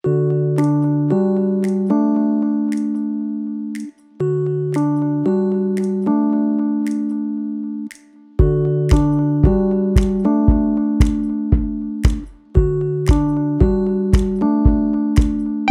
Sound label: Elevator Music